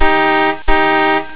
car horn twice

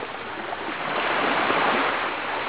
waves

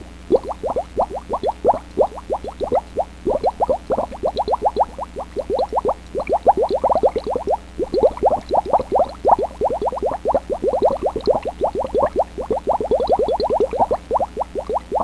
bubble sound

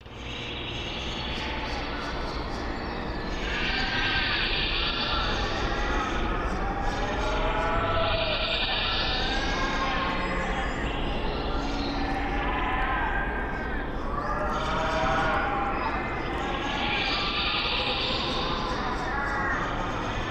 soft room; roomeyes; A tourist trap

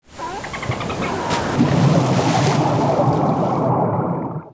underwater

